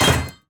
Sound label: darksteel shielder death; sfx